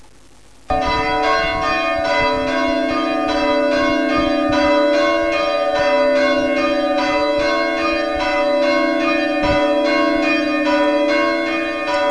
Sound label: bells; herringswell